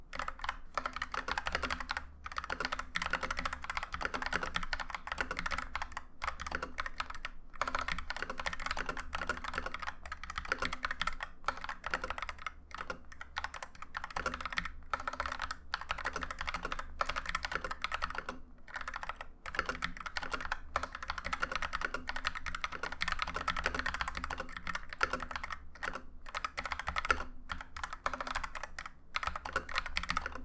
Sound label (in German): tippen